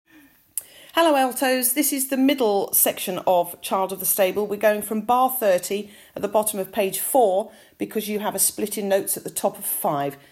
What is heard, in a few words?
Middle section Altos; Just Sing